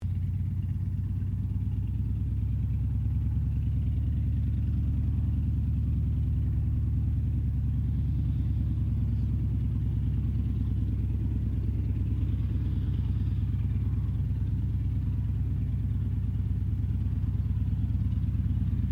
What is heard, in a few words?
AIRCRAFT CA-28 CERES PREFLIGHT DISTANT; Airplanes; Vehicles; Aircraft Collection